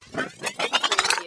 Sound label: CARTOON WIND UP